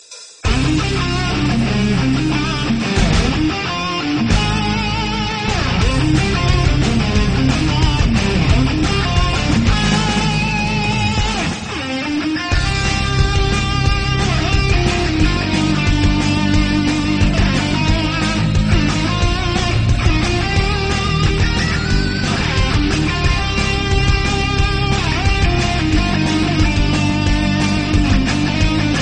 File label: guitar; Religious